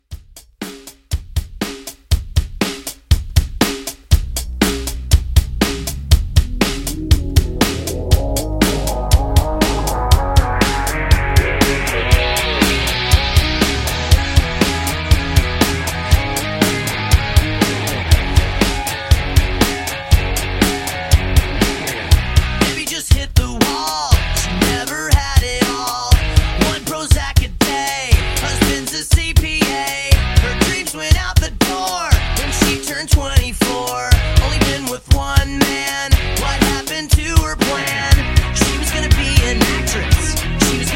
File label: Rock Re Drum 120